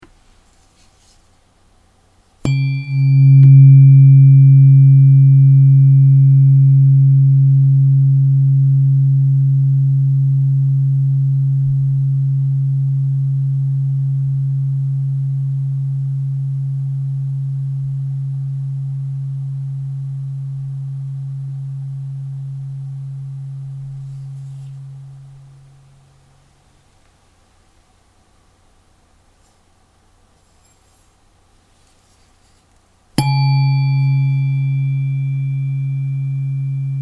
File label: Om Tuning fork; mid